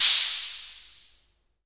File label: vox cym, Vox AC1 RhythmVOX; drum machines